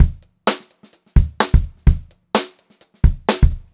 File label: 128 funky beat, funk groove drumloops; USB Soundscan vol.38; AKAI